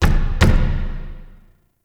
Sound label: Knock; Shadowgrapher; Warframe